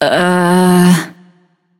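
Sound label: nonverbal seriously; smite; voicepack; izanami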